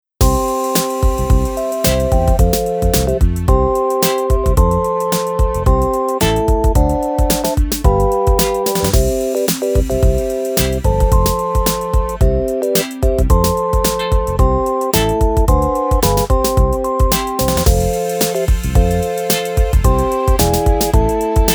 Tiny Advert Background

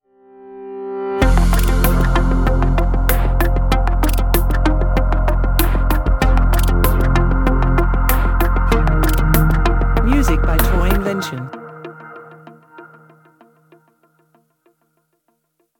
Hi Tech Business stinger

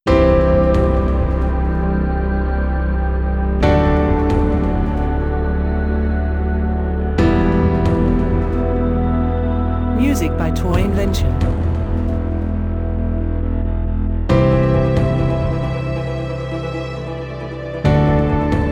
Life In Reverse background

